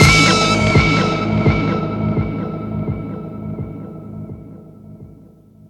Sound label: Slamin Echo Ending; Sonic Foundry; Fast Breaks